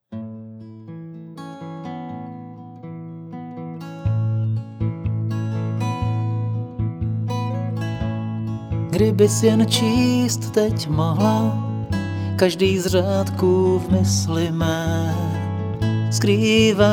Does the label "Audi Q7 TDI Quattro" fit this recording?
No